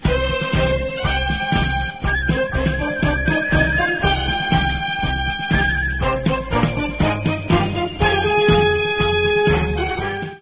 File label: ringer; Ringtones